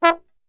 E 2, Brass Super Section, TRMB SHOT; Best Service; 90 s Sample CDs

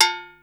mambobell, Yamaha Motif ES drum machine; 300 Drum Machines; Yamaha Motif ES-Series

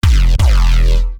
Reese; neuro; BIG5AUDIO

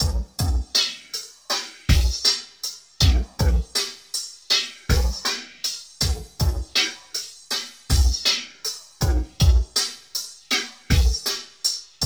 FAZE HOP, DRUM LOOPS; Zero G; Malice In Wonderland

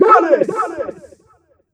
HUUTHIS, funky vocals, rap ragga; USB Soundscan vol.18; AKAI

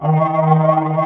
Korg, FX

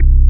bass C2; Best Service Gigapack 2

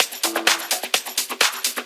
Latin Loop 128 BPM

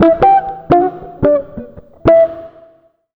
jazz, acoustic guitar loops

